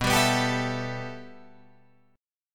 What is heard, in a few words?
B 7th Suspended 4th Sharp 5th, Guitar, Open F; B7sus4#5; strummed; JGuitar